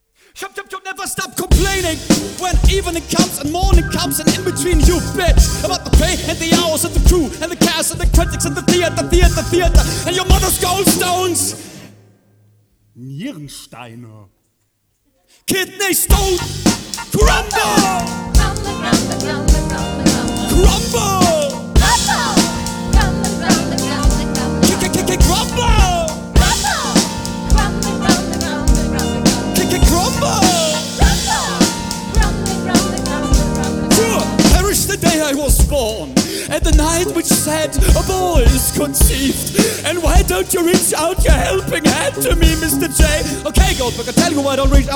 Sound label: Goldberg Variations Rap